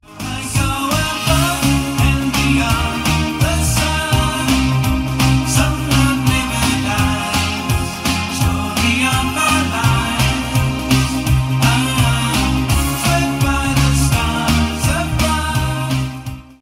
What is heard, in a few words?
Pop Ringtone